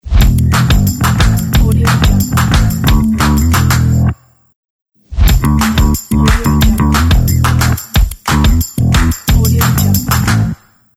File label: bass logo